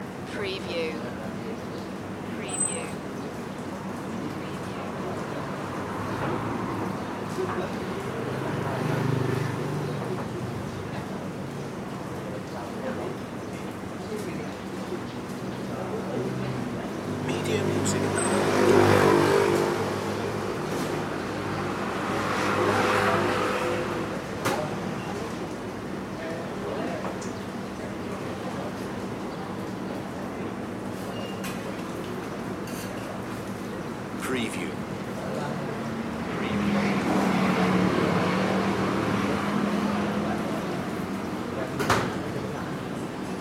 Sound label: street restaurant ambience loop